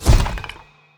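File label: voodoo kamikaze golpe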